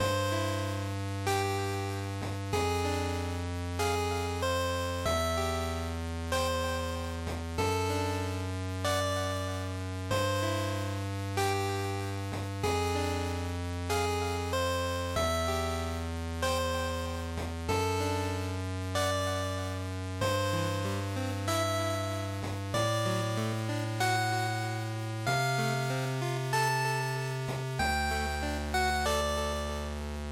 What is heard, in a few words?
GB RPG Loop95